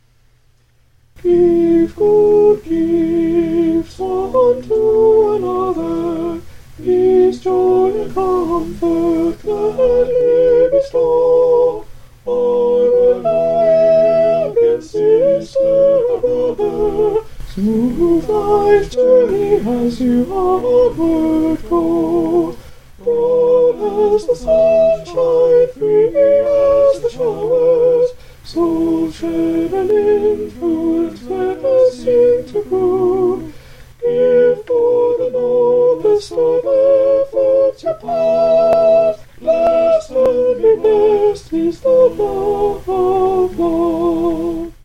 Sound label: soprano